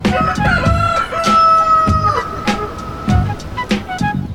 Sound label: rooster; kelloggs; senmis logo